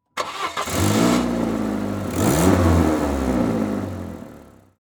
Start the Car; This Is the Police

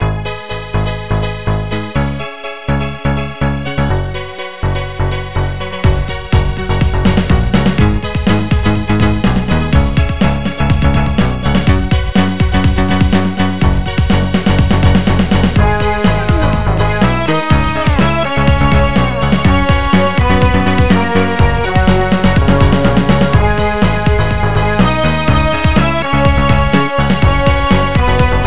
Ending Sequence, High Score Achievement; The Untouchables; snes

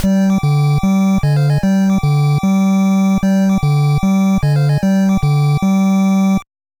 Fanfare; Faria: A World of Mystery & Danger; nes

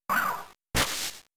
Smoke Screen; Pokemon; Gen 3; attack moves; RSE

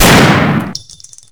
DEAGLE

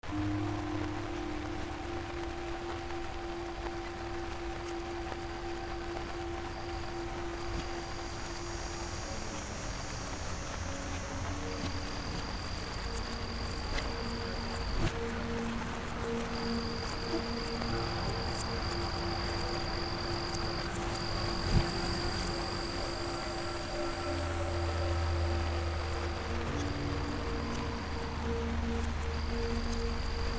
Soundscape (Rain version); Who's Lila ost 2022

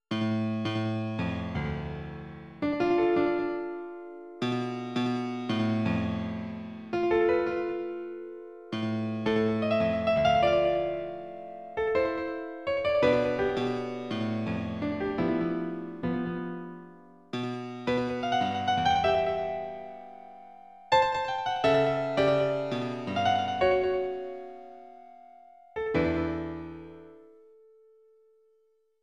Piano Solo; The Legend of Zelda Majora's Mask

